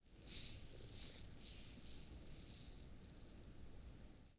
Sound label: Spider Hiss, Vocals - Monster; Sony Pictures Sound Effects Series